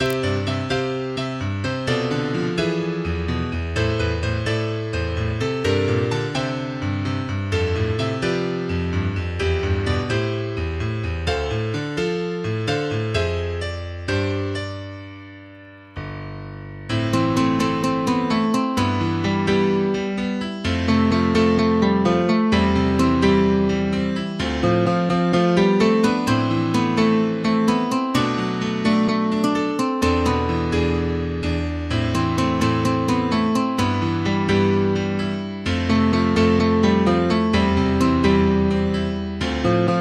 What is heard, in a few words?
guitar version, MIDI; Anime closing